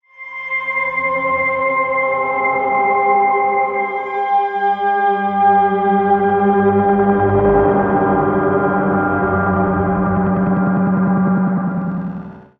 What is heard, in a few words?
Tonal Modular Drone; ambience; drones